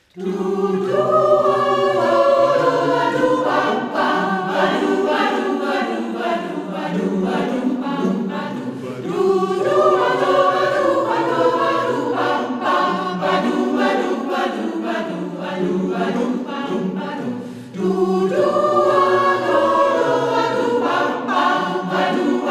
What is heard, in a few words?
Bourree; Klangfelder